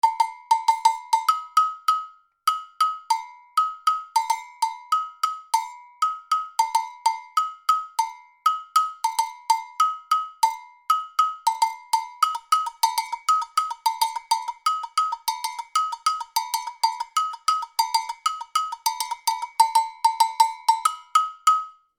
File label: Agogo Bells